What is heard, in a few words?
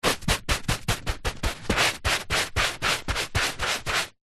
household cleaning paper towel rub scrub carpet dabs forced